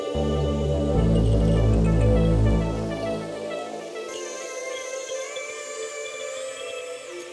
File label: Abstract Landscape, Pads